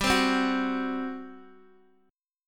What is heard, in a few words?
G Major 7th Suspended 4th, Guitar, Open D